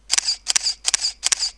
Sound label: Olympus E-500 continous